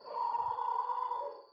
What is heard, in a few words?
hornbill, jungle; ambient soundscapes; dota